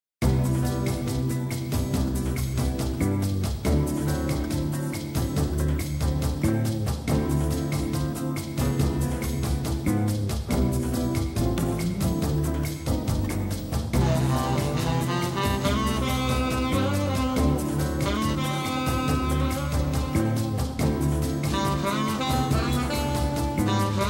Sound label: Mall Music; dead rising